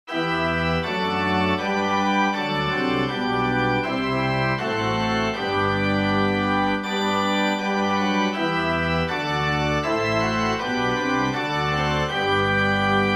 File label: doxology organ